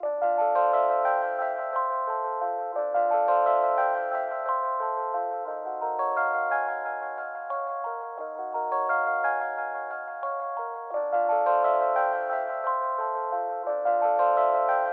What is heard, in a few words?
Ambient Lofi Melody Loop 88 Bpm